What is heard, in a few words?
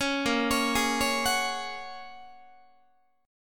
A sharp Minor 7th Sharp 5th, Guitar; arpeggiated; 16,18,16,18,17,17; 4A,4E,5A,5D,5G#,6C#; JGuitar